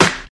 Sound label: airy rap acoustic snare sample c key, Royality free snare single hit tuned to the C note